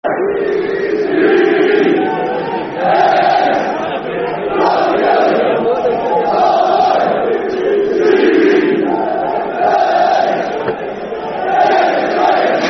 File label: Gimme An Iiiiiiiiiiiiiiiiiiiiiiiiiii [Football Chants (Soccer Songs)]; fanchants; Ipswich Town Itfc